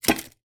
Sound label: food cake small in cake case down on plate